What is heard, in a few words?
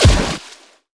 enemy elemental ranged impact